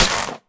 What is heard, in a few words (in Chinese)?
沾泥的红树根破坏声